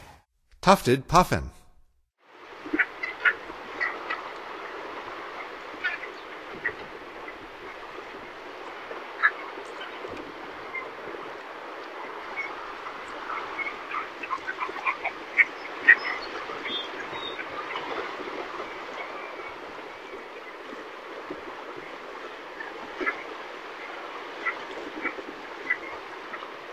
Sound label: Tufted Puffin; North America; Stokes